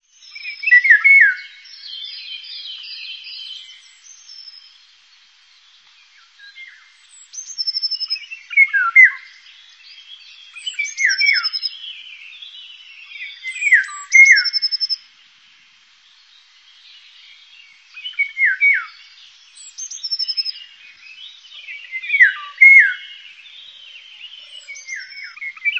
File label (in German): Stimme, Vogelstimmen